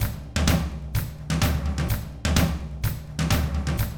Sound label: 127 Drums